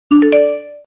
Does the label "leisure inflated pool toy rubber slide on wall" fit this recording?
No